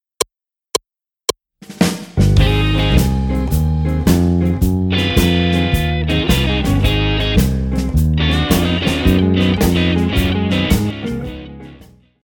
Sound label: jump blues in ab part 1